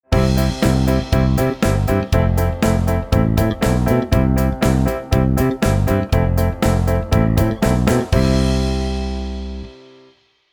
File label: backing band, piano